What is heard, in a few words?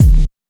tight lows bass drum single shot c sharp key; kick